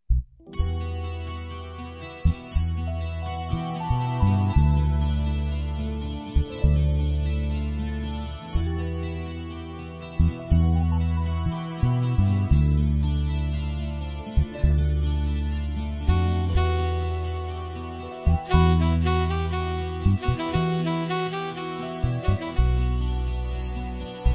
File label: Melody Example